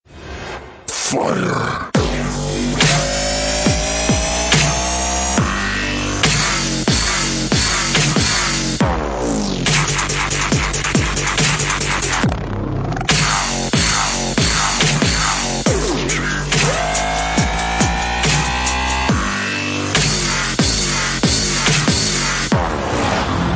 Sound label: transformers dubstep